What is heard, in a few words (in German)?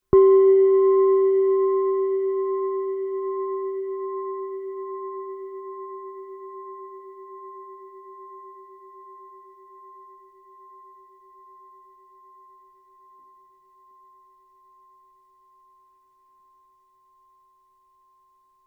klangschale erdentag 246g